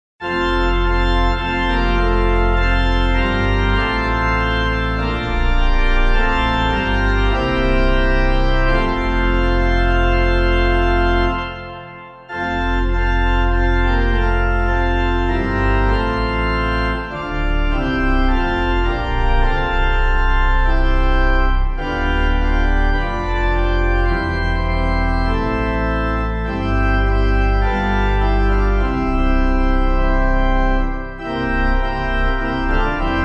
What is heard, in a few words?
organ, hymn; Come Ye Faithful Raise the Strain; Neale; SAINT KEVIN; Clyde McLennan